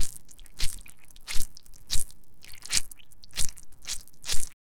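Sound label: sound continuous soft squish, baking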